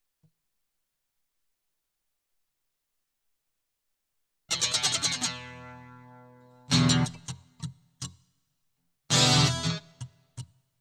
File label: Guitar